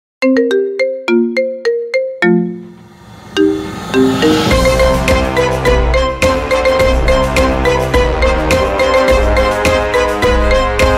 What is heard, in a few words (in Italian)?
suoneria Marimba